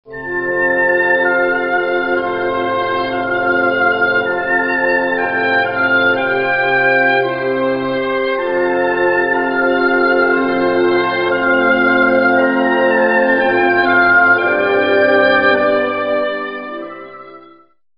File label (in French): hautbois orchestre; 2nd mouvement du concerto pour violon en ré majeur Opus 77 de Johannes BRAHMS